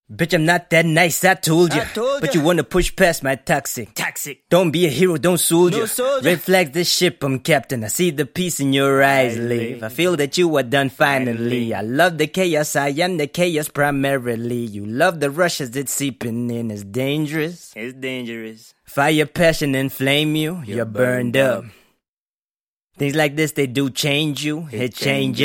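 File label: hip hop 120 C major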